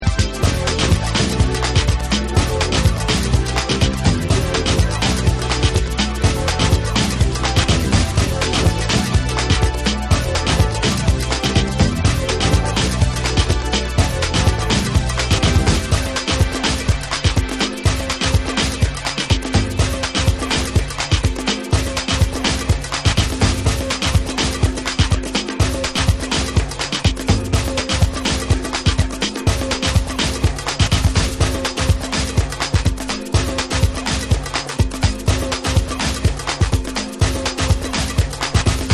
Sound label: Instrumental